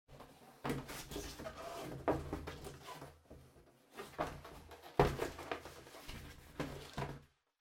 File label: Cardboard Box Far Fold; ダンボール03-29(遠い 畳む); 効果音＞梱包材